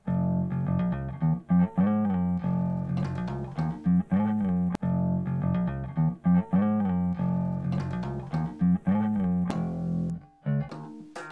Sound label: bass tracks